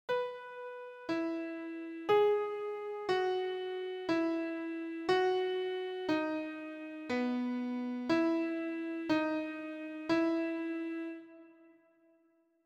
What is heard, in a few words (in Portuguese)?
Ditado de sons Mi Maior piano